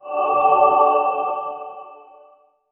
gothic medieval hero leve, gameplay

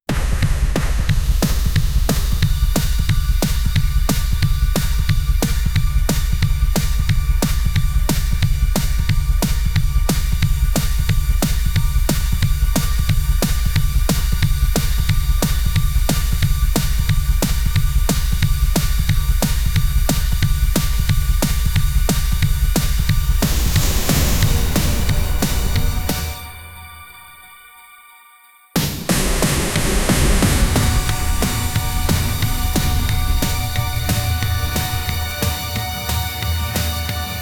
aggressive fantasy loop, overheat

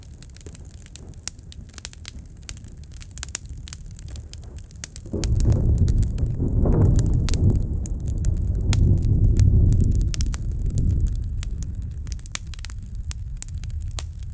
a great fire, eruption

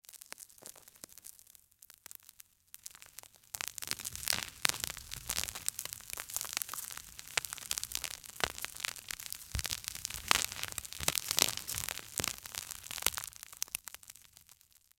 cinematic the soil cracks, earth

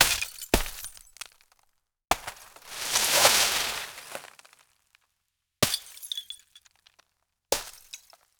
epic ice attack

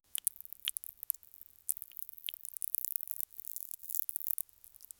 sound extremely soft dry, object

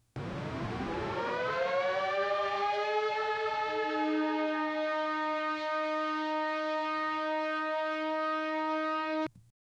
warning

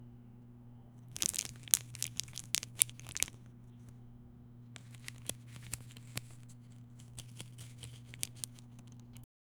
applying pizza sauce on

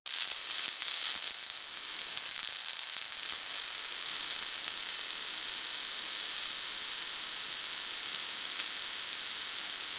plastic

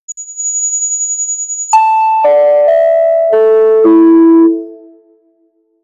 menu enter select, ps1; ff7

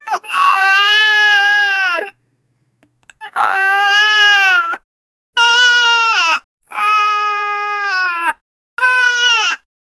boy crying

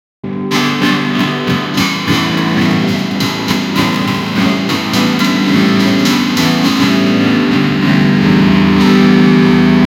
sound of an electric guit

